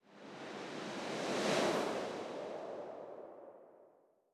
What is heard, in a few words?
ambient port default; World Of Warships